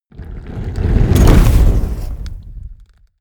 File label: whoosh fire lava wave medium